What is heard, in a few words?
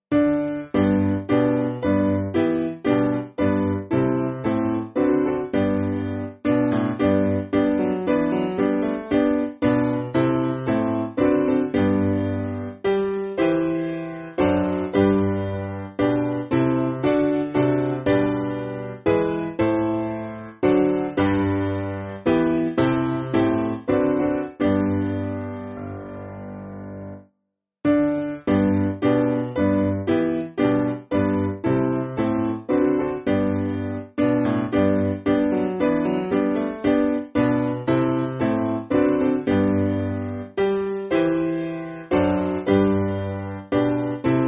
Piano; Round Orange; Lourdes